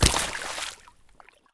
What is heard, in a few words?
swamp landing; Escape from Tarkov